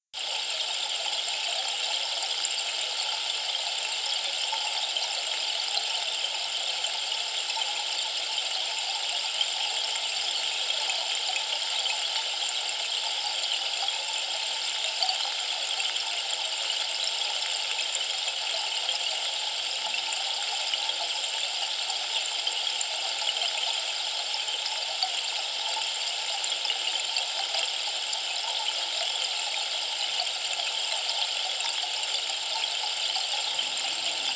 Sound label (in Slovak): briza, dazdovy stlp 90 cm; harmonizujuci; breza